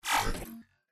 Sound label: buzz; starwars; episode vii the force awakens